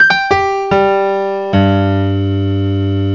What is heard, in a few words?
Piano; Alternate Reality; sonix; chipmusic